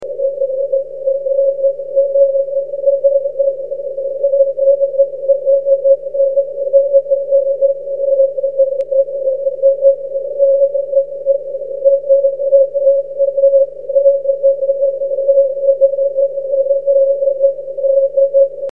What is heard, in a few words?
6cm; JA6CZD